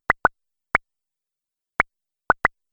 Idea Bubble Pops, cartoon; Cartoon Sound Design

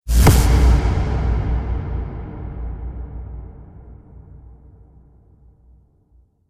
Cinematic Dark Hits; Sound Design; Hit Sound Effects

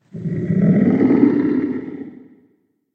Big Alien Bugger; Alien Sound Effects

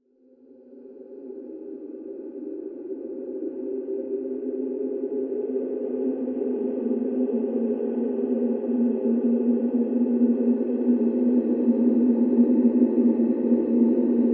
Horror Drone Airy Ascend Descend; Evil

